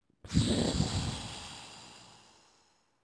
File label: Death by Bubbles; SFX; GGJ 2022